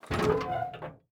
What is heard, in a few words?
door double metal open start; Rust